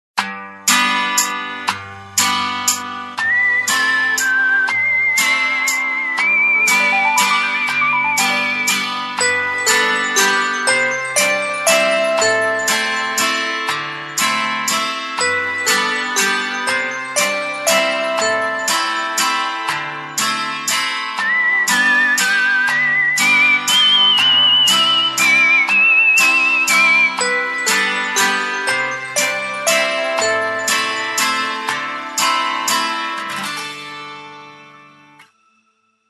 waltz; Coolpad; S60; sysring

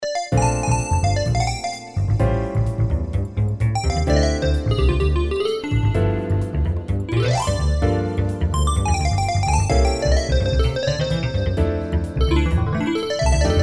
Dream Swing; ZTE F160-Orange-Atlanta

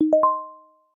fingerprint success; LG V10

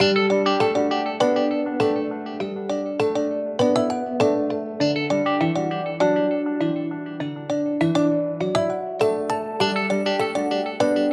Dewdrop, alarms

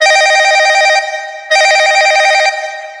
ringer Standard; ZTE Open-C

